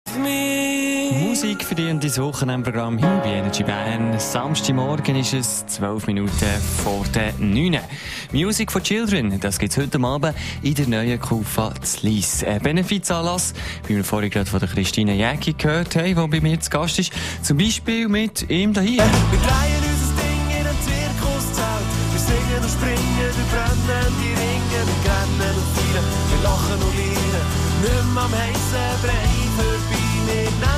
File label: music for children